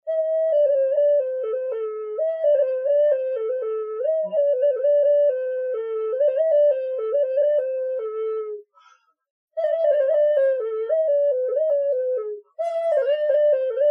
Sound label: svistulka